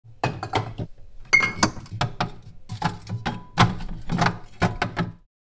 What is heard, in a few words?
Kitchen fridge bottle and food movement